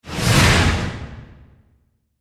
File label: Space Whoosh Airy, Dark; Science, Fiction; Sci Fi Sound Effects